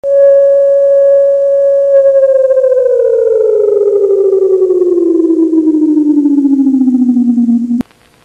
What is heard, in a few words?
eas alarm; israel